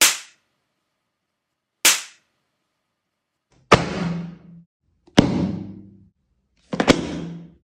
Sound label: sharp slap then a thud